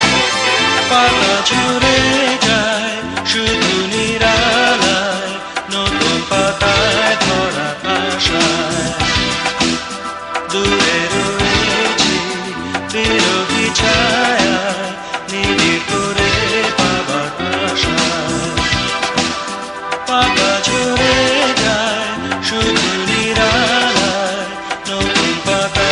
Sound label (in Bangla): Bangla Popular Song